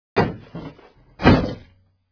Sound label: car boot slam